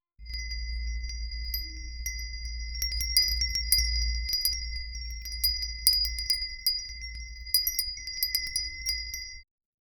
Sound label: a crisp ambient sound eff, holiday